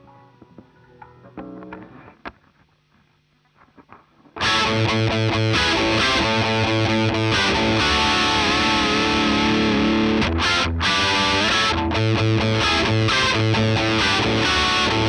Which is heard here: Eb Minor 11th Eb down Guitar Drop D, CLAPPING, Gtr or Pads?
Gtr